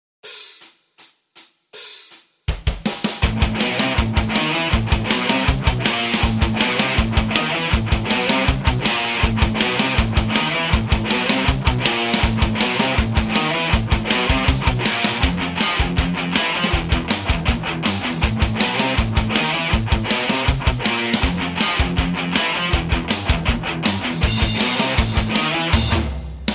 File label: bass metal, backing tracks